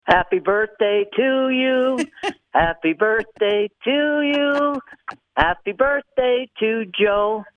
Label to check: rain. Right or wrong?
Wrong